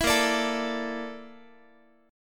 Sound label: Bb Major 7th Flat 5th, Guitar; strummed; 19,18,17,19,x,x; 4A,4E,5A,5D,5G#,6C#; BbM7b5